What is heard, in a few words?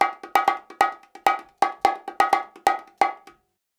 130 BONGO, Percussion Loops; USB Soundscan vol.36; AKAI